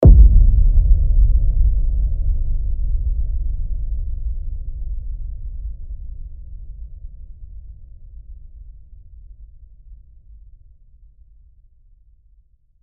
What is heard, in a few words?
foot step